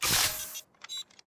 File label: reload, arms; EMXArms; pmg90